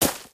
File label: gravel, human step